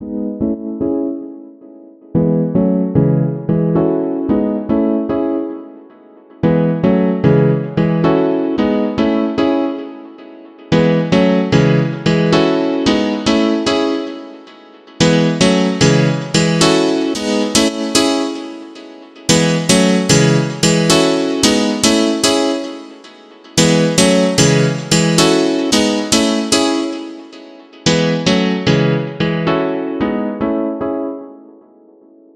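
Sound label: Break Piano, 112, G minor, Tail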